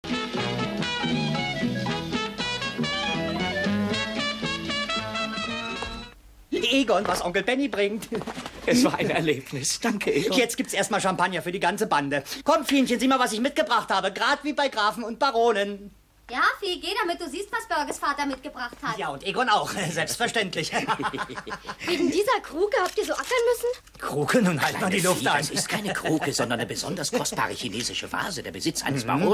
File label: Ping